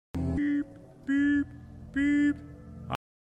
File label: beep beep beep; nick valentine